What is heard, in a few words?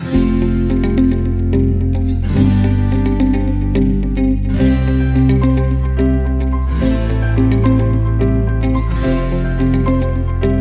Acoustic Inspiration No Drums